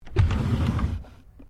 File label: Drawer Laminate Medium Empty Open; Various domestic drawers; Household; SoundEffects Foundation2